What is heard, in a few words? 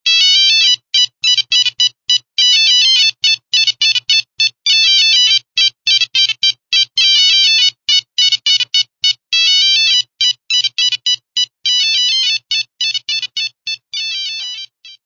flyby